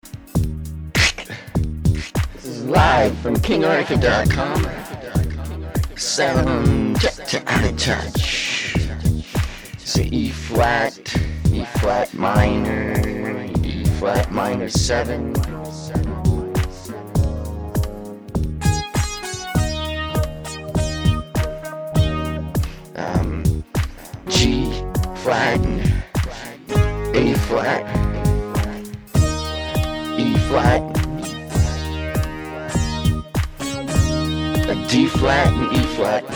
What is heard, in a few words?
Soundcheck